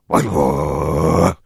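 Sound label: sounds of voice of caveman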